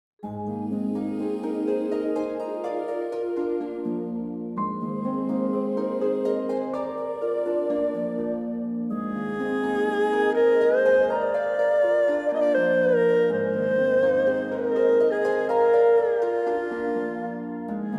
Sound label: Erhu; Genshin Impact